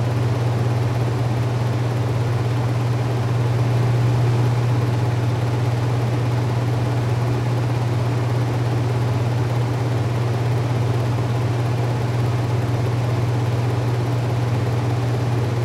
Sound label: FAN LOOP; CRAZY SCI FI AUDIO